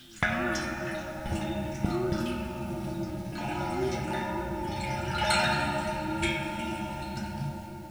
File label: water; ウォーターホーン09; 不穏でミステリアスな音 Waterphone